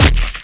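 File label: usp shot silenced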